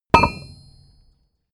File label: metal tool drop massive; soundpool